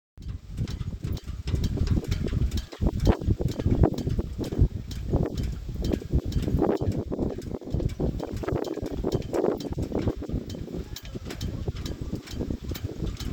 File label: Wind In Boatyard St Suliac; Field Recordings